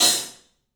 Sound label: club hat; 3D Sound; 3D Drums